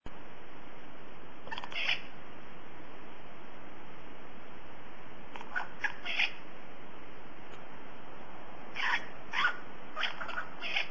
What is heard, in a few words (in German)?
Moulting Lagoon Voegel